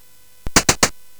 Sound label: Space Gun